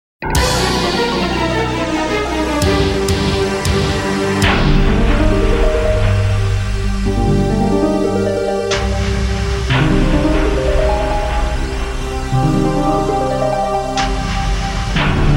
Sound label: Underwater